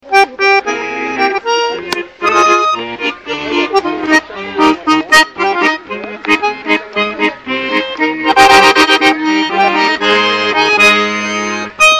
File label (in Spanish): acordeon ringtone; flamengo